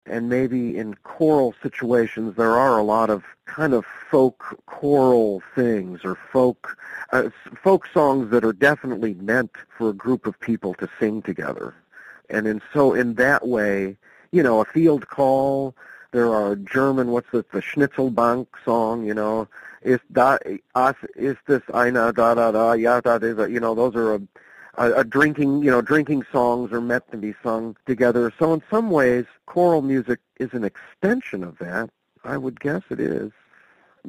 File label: CHORALE MUSIC